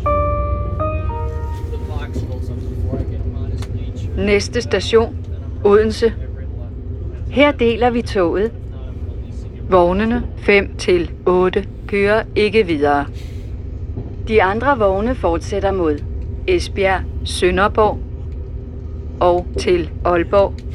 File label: Odense; Odense (toget deles); Næste station: Odense. Her deler vi toget...